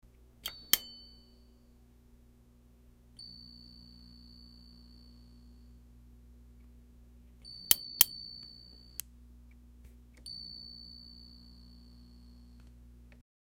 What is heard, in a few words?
light bulb flickering and; electricity